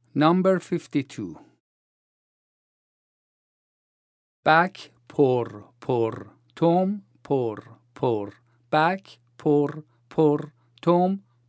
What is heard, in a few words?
vocalization and rhythm, Daf